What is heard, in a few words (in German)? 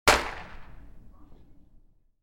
Gewehrschuss